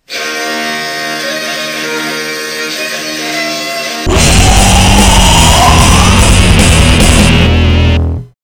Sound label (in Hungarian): infectus nepdal